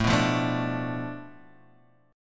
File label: D Minor 6th A, guitar chord